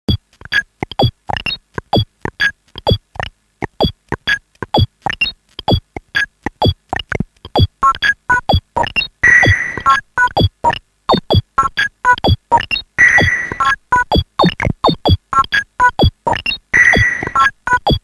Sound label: Talking Synth